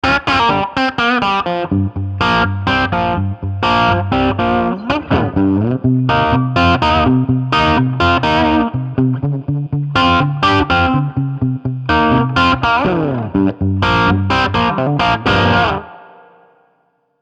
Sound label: Radial Tonebone Texas Bones